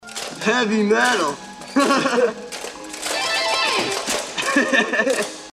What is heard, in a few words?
Heavy metal; Bill & Ted